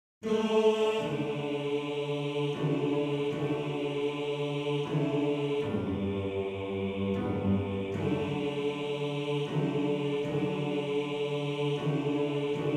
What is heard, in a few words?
Bass 2, maennerchor